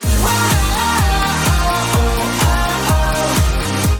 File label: whoop